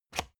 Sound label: spring binder unlock